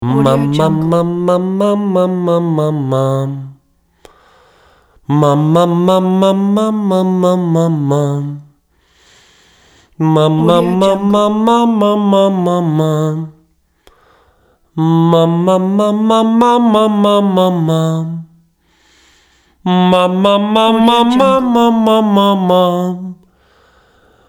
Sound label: male vocal warm up